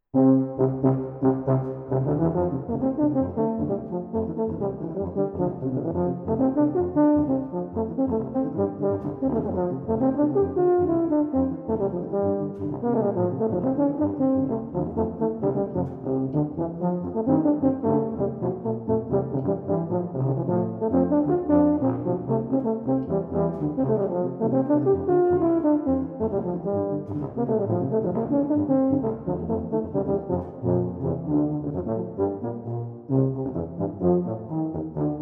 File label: Tuba Trio